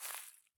walk grass low; Escape from Tarkov

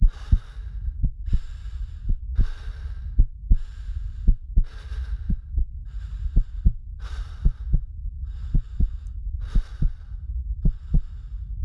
wounded first person loop; Rust

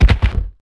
Body Fall; Civ4

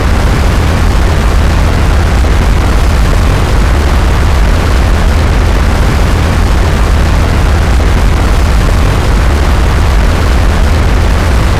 Aircrafts Engines Plane Afterburner; DCS

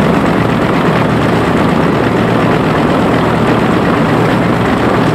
engine bomber mid; engines; War Thunder

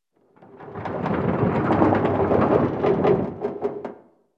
ac130 rattles

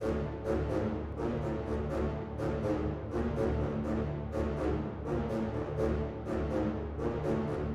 music interactive; World of Tanks

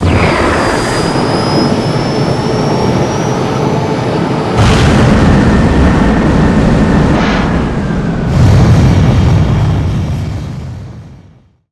speaker polycraft lift

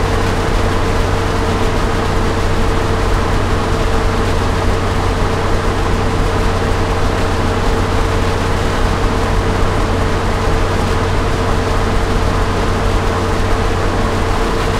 m1 engine rpm 66, tanks; engines; War Thunder

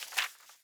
ui drop paper; Rust

